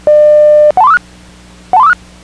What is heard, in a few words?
5 6 tones